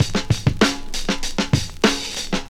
97 bpm breakbeat sample G sharp key, kick tuned to the G# note; drum loops